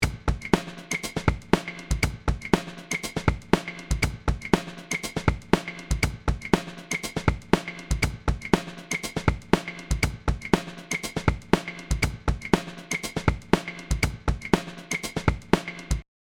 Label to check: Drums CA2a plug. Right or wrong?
Right